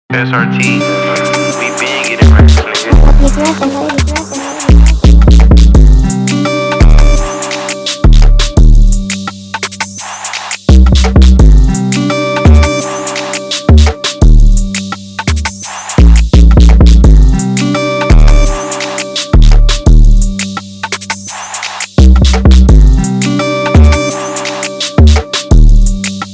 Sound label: Knockin Instrumental, hip hop rap type beat trap